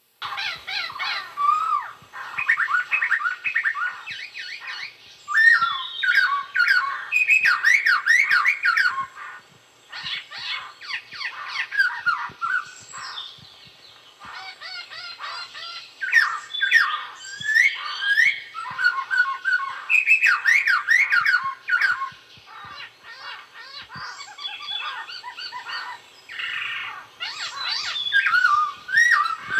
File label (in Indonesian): suara pokcay punggung merah